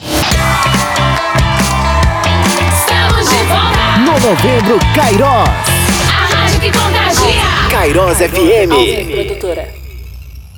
VOLTA BREAK; PACOTE ENTRADA E SAIDA BREAK

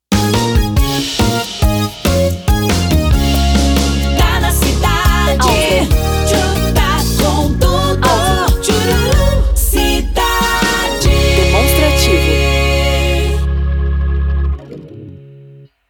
vinhetas cantadas; ALVIM PRODUTORA

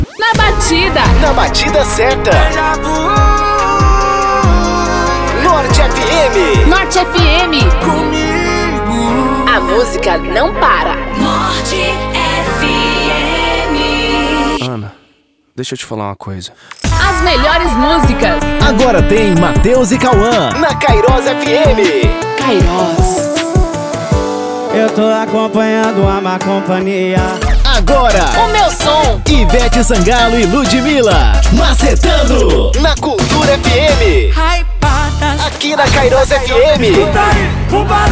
pacote intro music; ALVIM PRODUTORA

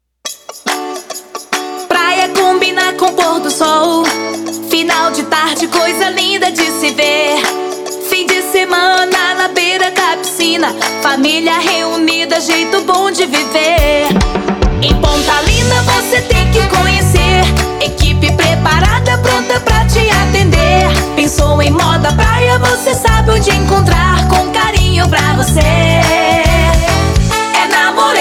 JINGLE COMERCIAL; MORENA ROSE; ALVIM PRODUTORA

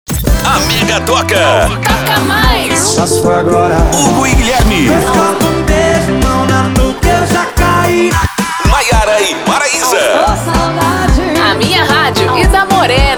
INTRO MUSIC; MEGA FM

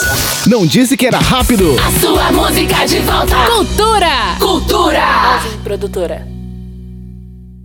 VOLTA BREAK; PACOTE ENTRADA E SAIDA BREAK

